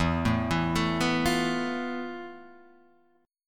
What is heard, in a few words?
F Major 7th Flat 5th E, guitar chord